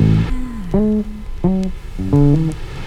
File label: bass balcony beach; Loops; Phonky